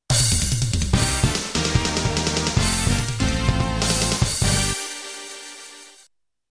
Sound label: music victory